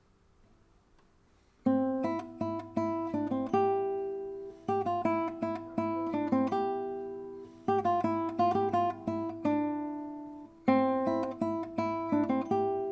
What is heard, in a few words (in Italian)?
chitarra